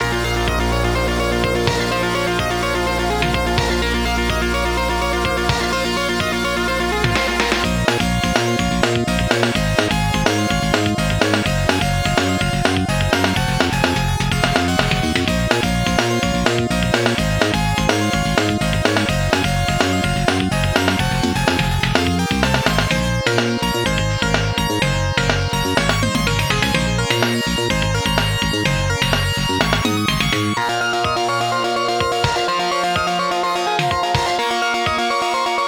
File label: FM Synth